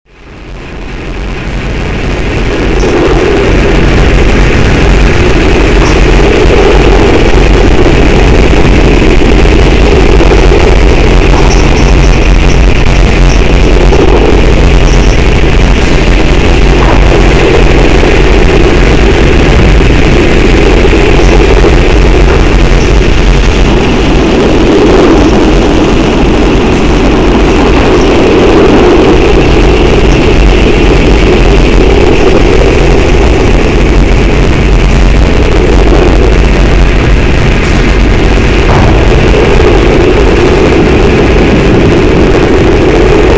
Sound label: Noise